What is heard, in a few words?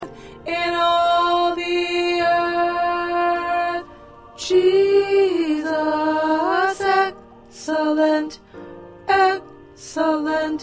Tenor, choir parts